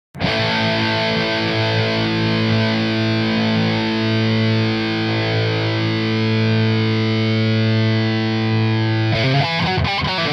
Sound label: Lead